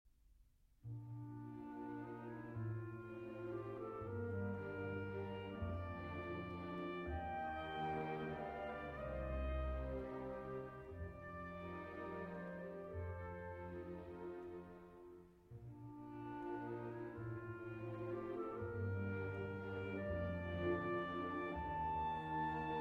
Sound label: Valse lente; Arr. J. Panula